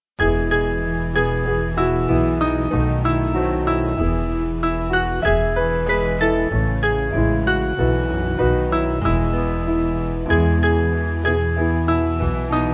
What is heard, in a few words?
Piano